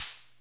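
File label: mt18 hi-hat open soft, Casio MT-18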